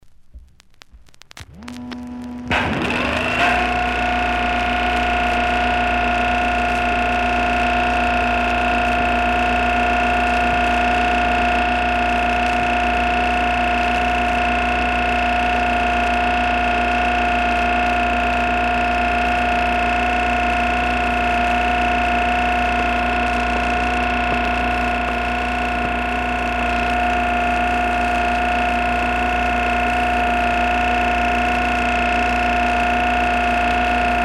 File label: Sound Effects; acetate disk